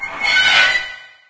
creak; ulife

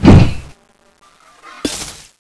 midslash fail, weapons

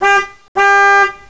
horn van

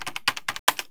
key error, buttons

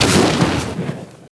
zombie smash boards

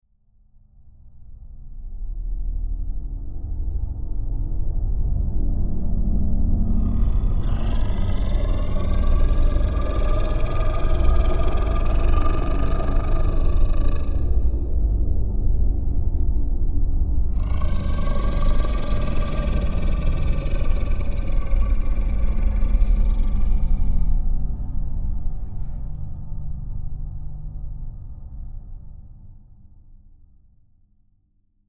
sfx texture

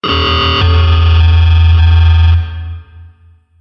alarm

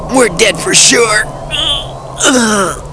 death; krillin

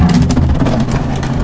push box

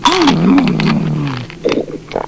candy eat; fatbuu

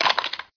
ammo shotgun small

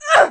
female chest hit

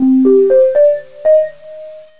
suit startup

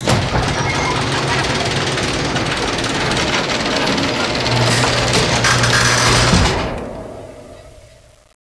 pipeline garage door

